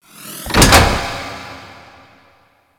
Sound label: Liset Hub Docking Latch A; Warframe; Ambience